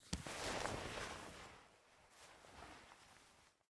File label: Take Hand Next; MiSide